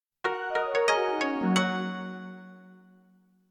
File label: Chinese Mood, notifications